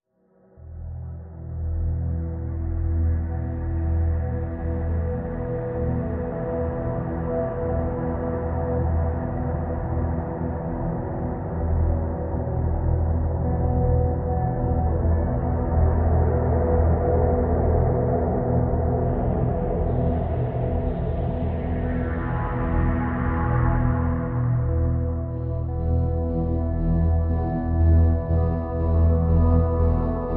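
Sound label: Drn Dimensional E, drone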